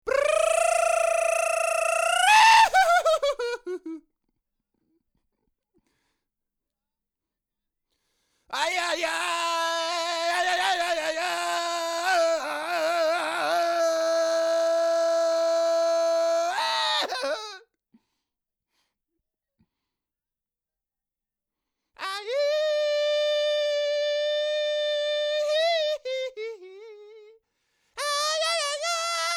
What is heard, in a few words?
voc